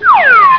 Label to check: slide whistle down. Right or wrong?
Right